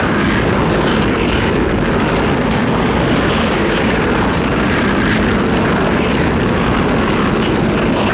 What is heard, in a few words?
beast pull in loop